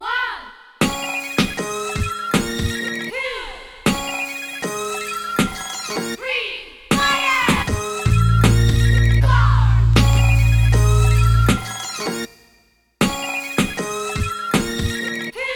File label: instrumental version